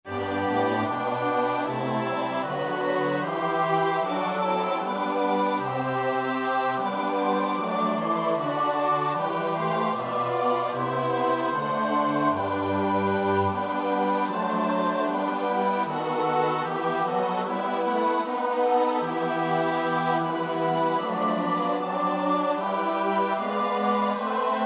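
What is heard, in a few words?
soprano alto tenor bass; Bach (Johann Sebastiaan); BWV 095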